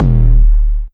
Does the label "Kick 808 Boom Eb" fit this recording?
Yes